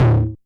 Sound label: RM1X Drum Anlg Kit Tom Ana Dist; Yamaha RM1X